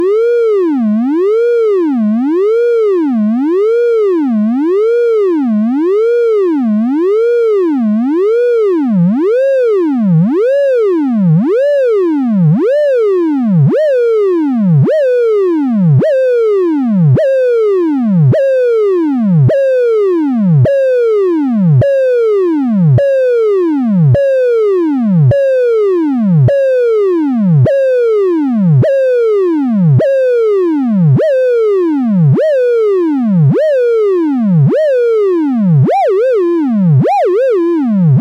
hp in lp out slew; sprott; synth

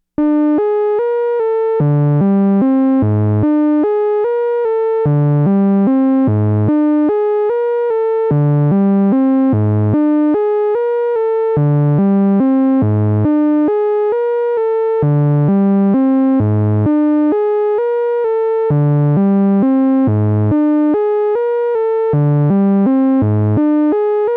motion cv sequencer

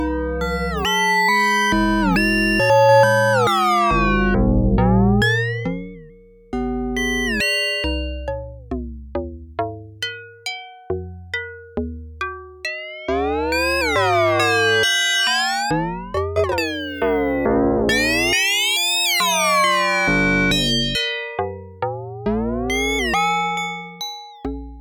rings

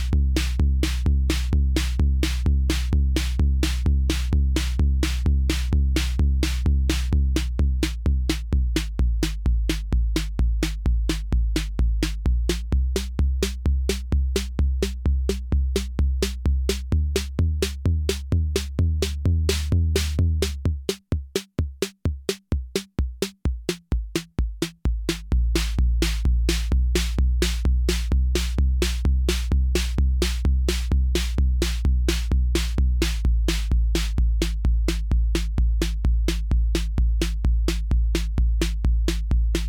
peaks drums, synth

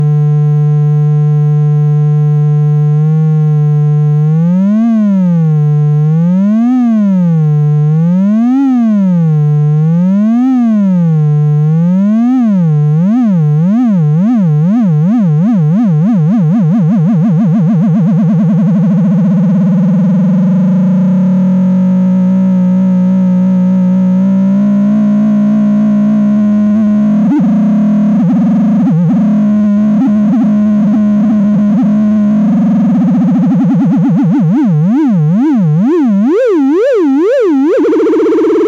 osc mod lfo env, synth

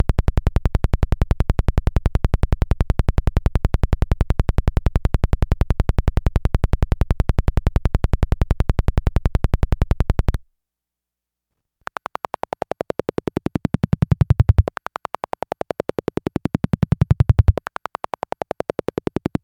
sprott pulse lp in; synth

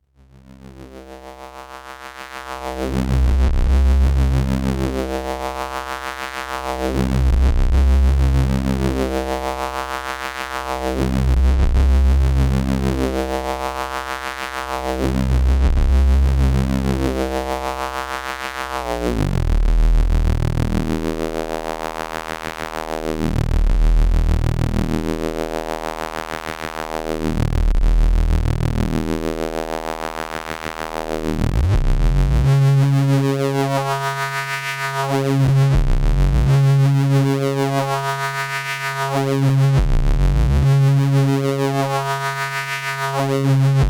sprott chaos mod, synth

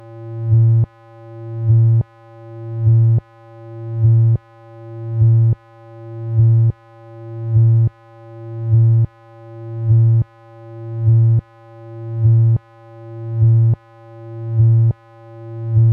in out fm chaos, synth; sprott

